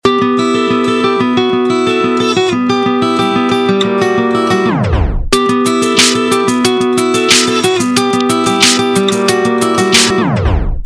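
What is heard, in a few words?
gitarnij motiv, guitar motif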